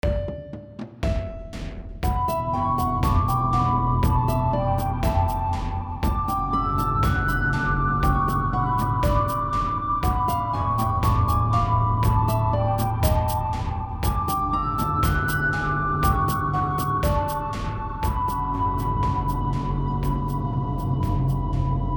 fourth intervals, a musical phrase for two instruments containing several perfect fourths